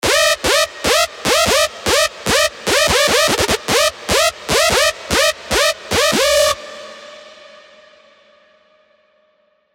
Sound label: Screech; Screech Instinct; alonso 18 year anniversary pack; Spire